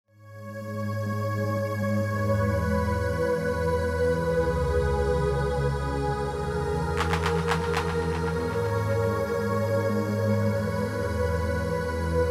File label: Ringtone